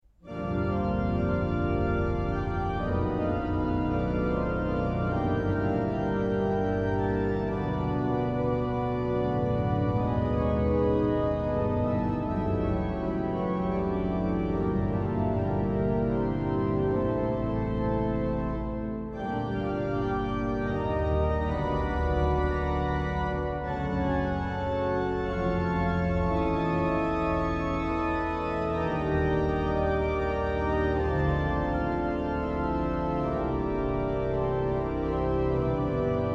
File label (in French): fonds orgue